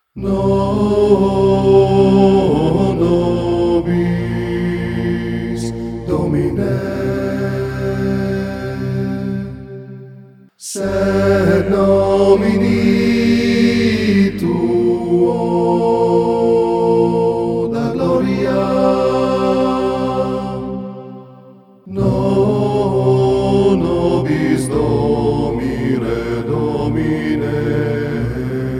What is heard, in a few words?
knights templar chant